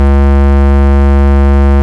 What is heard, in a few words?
Vengeance Ultimate Bass C#, Root C#; Vengeance Samples